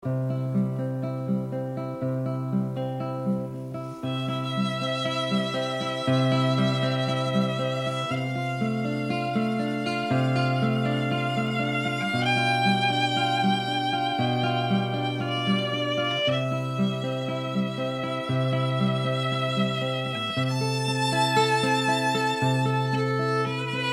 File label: Gtr Vln Ave Maria